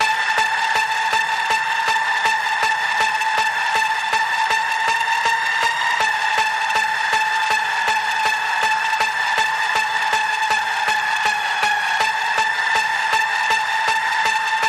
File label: Paranormal Panning Pulse A, Key of A